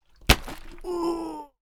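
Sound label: sounds of a person falling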